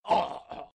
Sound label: knight death